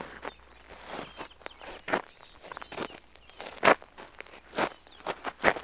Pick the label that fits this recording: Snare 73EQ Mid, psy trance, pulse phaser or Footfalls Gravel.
Footfalls Gravel